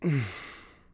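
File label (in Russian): мужской уставший вздох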